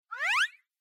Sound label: Anime Motion Long; アニメモーション32-2(長)